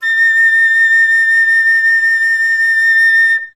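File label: flutes A5; Best Service; Peter Siedlaczek's Advanced Orchestra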